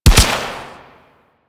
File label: Goo Gun Shot